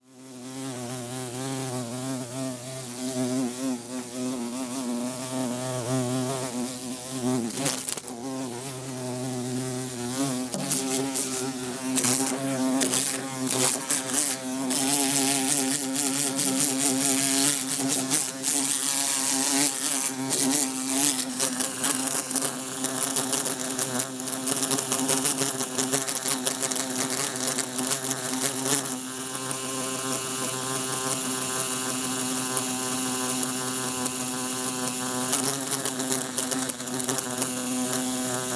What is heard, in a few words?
Bumblebee trying to escape thru closed window